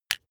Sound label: razor fold up hard